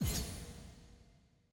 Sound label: ui cta click; tft sgo2021